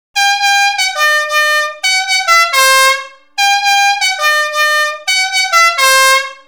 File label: fiamm m4 o sole mio novelty penumatic music horn trumpet bocina car van